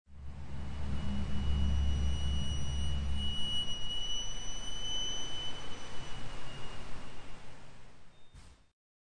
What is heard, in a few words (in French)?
gliss